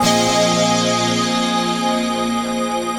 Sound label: Pad; Keys; Pads